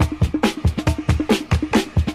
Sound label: you have the clap; Drum loops